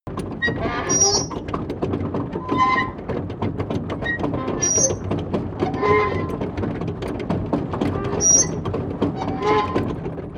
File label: tank small unsteady damaged